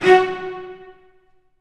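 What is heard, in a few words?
Cello; E-Mu Proteus Pack; Digital Sound Factory Virtuoso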